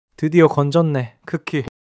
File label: laughter